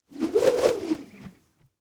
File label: Weapon Mace Whip Circular; AdobeCC